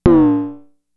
FX, Drum Machines; Fricke Schlagzwerg

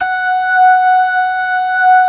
ORGAN LOWER MANUAL

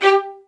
G4, LOUD VIOLINS; Q Up Arts; Denny Jaeger Master Violin Library

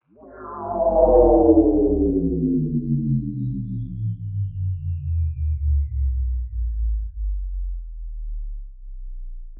Landing Craft; Zero-G - Future Wave

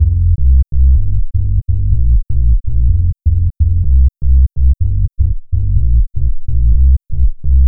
bass 125; BASS LOOPS; House; Best Service ProSamples vol.10 - House; AKAI

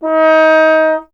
trombone; Miroslav Vitous; Solo Instruments VOL-1

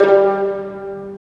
STR VLN PIZ, String Sections, Violins Pizz; Roland LCDP13

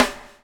BUBL, BUBBLE; Spectrasonics - Backbeat

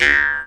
D JAW, Primitive Instruments

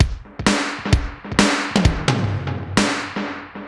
Space Echo HR-16B 130BPM; musicradar; cyborg beats

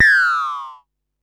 Perc Synth Raw; sci-fi

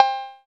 DMA XD-5, DIGITAL; 90 s Sample CDs; Sound & Vision - Gigapack I CD 1 (Roland)

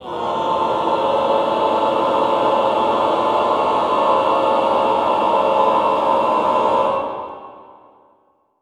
CLS LOW, Classical Choir; Best Service; Extended Classical Choir; CLUSTER

